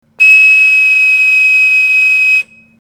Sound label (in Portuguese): apito